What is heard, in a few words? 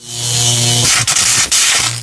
sfx tesla zap; kids-tetris; Lab